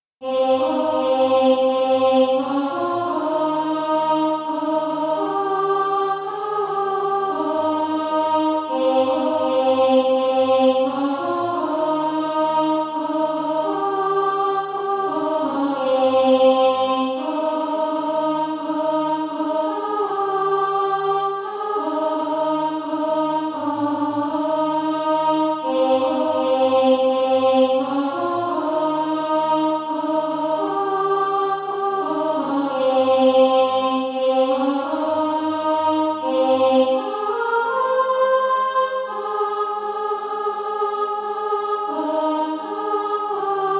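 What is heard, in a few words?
alto, SATB Practise Music; Easter Offerings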